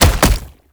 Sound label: Proto Infested Gallop; Enemies; Steps; Warframe; Brachiolyst